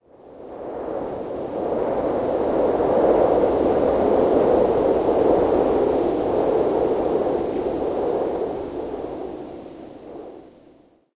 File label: tree wind, forest; ambient; Left 4 Dead 2; Source Engine